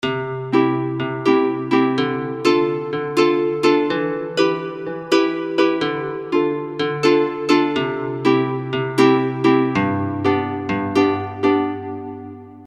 XV2020 Sailing Away

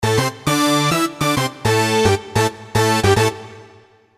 MU50 Saw Choir

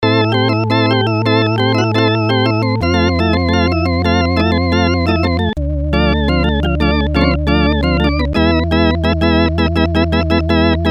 MicroB 60's Riff

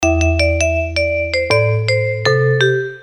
MU50 Good Vibes

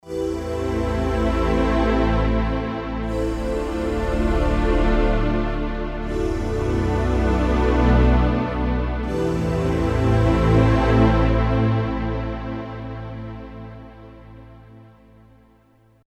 NX5R First Light

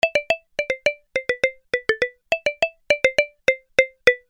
FB01 Tickle

